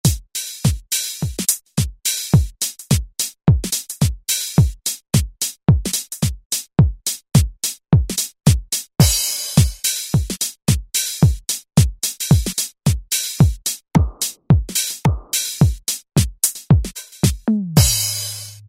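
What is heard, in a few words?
House Kit, drum, Yamaha EX5R; Factory presets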